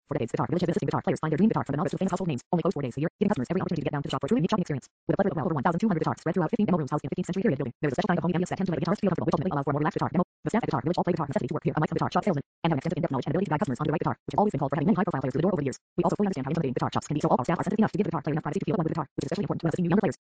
guitar village

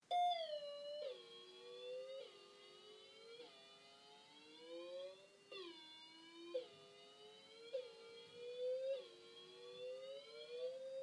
broken doorbell; cheltenham

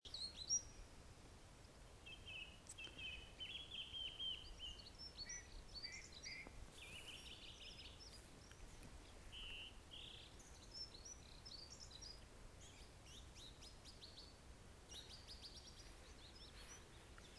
birdies

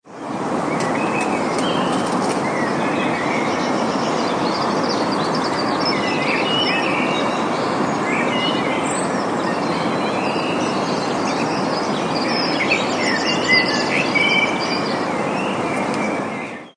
melodious evening blackbirds

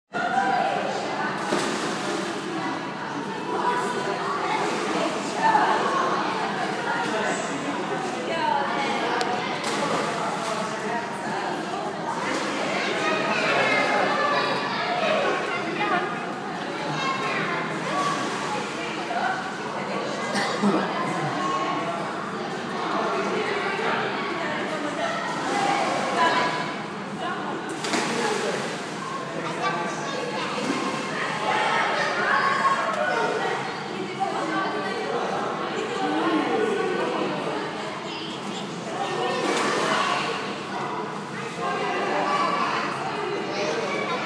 swimming pool ambience

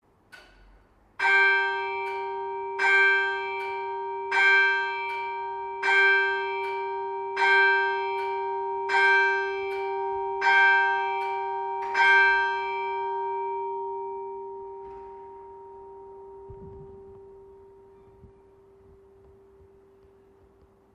clocks striking at eight am in worcester

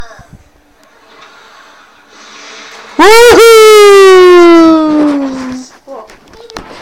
woohoo

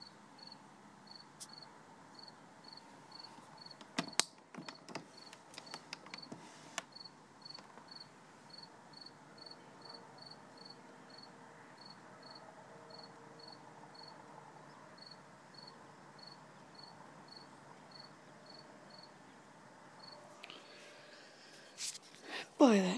annoying cricket outside my bedroom window

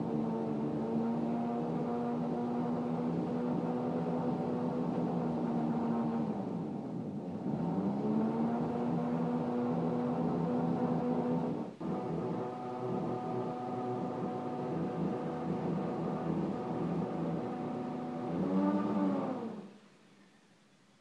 noisy neighbours

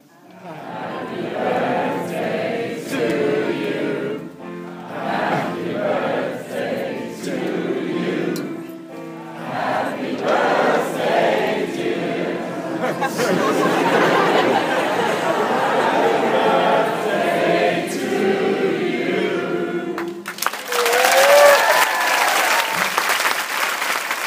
happy birthday sung; aung san suu kyi

